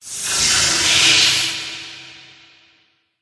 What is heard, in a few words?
bat